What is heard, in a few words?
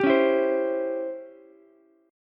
C sharp Minor Major 7th Flat 5th C sharp down Ukulele; C#mM7b5; strummed; JGuitar